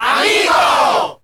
SE GAME SLIDE; maimai

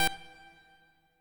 pico

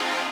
lead

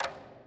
orch percus

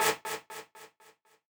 stab

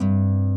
harp

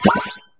SE GAME BREAK; maimai

